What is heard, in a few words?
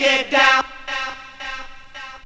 VOX SUNG; Megabass Remix! 2